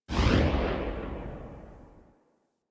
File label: Arch Long Rifle Tail, Weapons; Warframe; Archwing